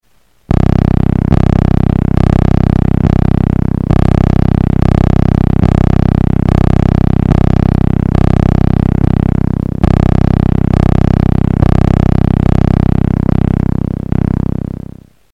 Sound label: rocket